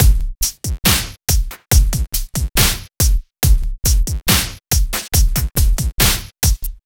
Slomo Beat 140 BPM, Loops, Slow Motion Beats

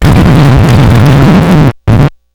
Needle Drag, Vinyl Record Samples

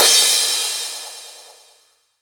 Crash, Cymbals; Vengeance Samples; VEH2

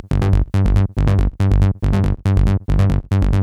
Bassline DRY 140BPM, G minor; Vengeance Samples; VTS1 Devastating Truth Kit

